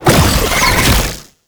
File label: Proto Infested Brachiolyst Hover Start; Warframe; Abilities